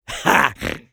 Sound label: Medic laugh short fr